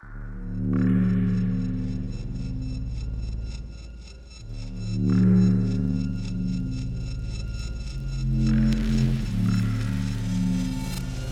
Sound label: Breach Music End